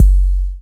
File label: phat steel kick drum F sharp key, Royality free bass drum tuned to the F# note; kick drum samples